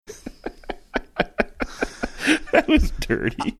laughing saying that was dirty

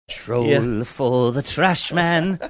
singing troll for trash man

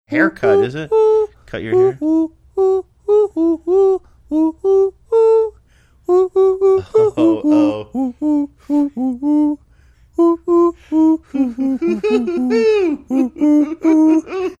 hoohooing song

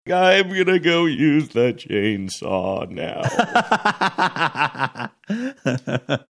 singing im gonna go use the chainsaw now

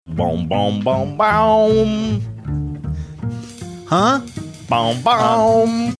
singing saying huh

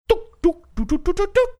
doo dooing some notes

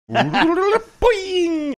making arm spinning sounds

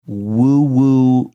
whoo whoo

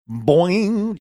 boing

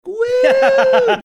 wheee ooooo

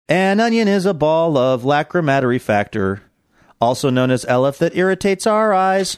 singing an onion is a ball full of

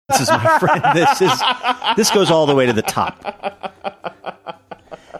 having good laugh

